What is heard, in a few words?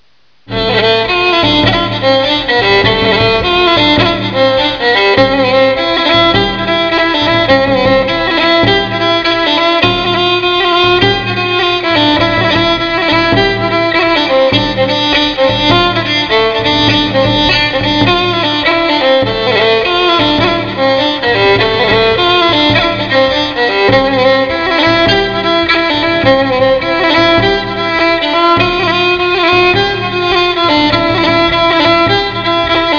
fiddle